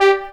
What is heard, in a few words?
Synth Shot G